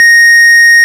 ORG Farfisa; Roland LCDP09 Keys of the 60s and 70s 1; Farfisa Clar

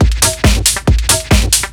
drm 138, Techno, drum-loops; Techno ID; loop-lab

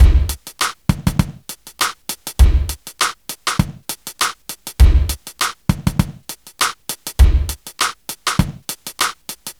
Smooth, R&B Drums

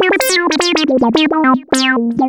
lead (brass)

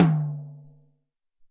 Nice Tom, Alesis SR-18

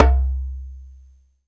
SURUBA, African perc; Greytsounds VOL-1

